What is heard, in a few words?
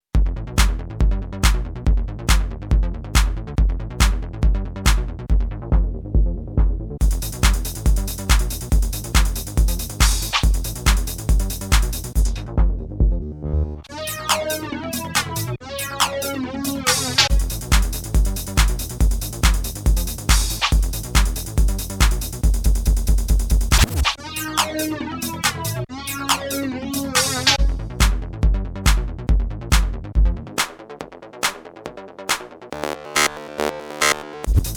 Casio XW PD1 Dance